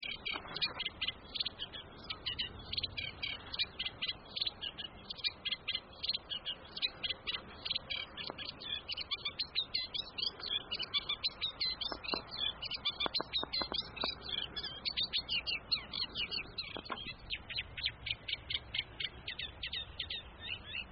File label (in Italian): cannaiola